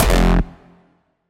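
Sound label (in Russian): звук ноты фа в нижней октаве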